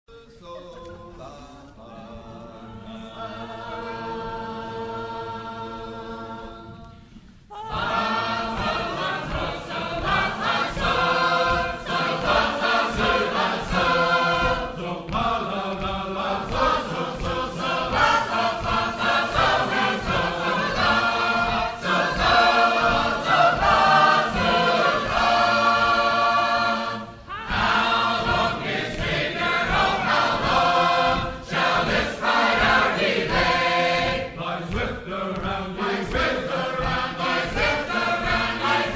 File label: Sacred Harp; Portland; 2006 Convention; Northfield